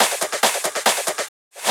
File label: Fills, Loops 140 BPM